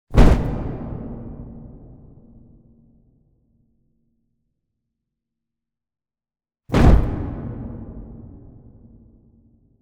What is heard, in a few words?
Monster Footsteps